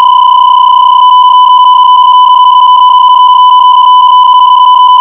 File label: BPSK31 -18db